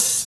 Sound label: Open Hhat, HiHat; Equipped Music; Loungin House